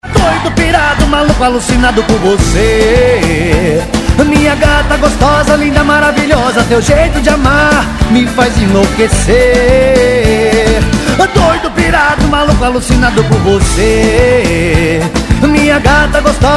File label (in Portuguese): ringtone doido pirado